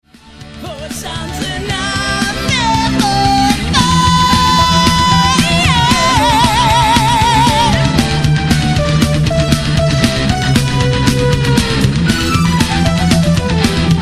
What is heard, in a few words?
lead sample Korg Wavestation